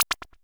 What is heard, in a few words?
SFX; browserquest; android